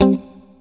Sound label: STRAT, GUITARS; Synclavier Sampler Library